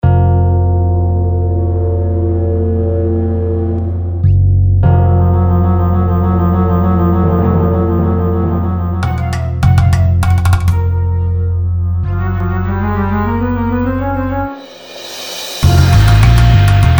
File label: epic battle